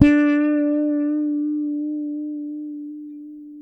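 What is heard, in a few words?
fret finger D, Pedulla bass; Masterbits CLIMAX 7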